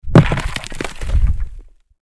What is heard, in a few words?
rock